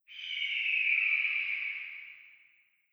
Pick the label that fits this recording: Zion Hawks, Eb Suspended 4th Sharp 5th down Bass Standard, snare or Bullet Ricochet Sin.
Zion Hawks